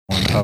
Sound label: snorting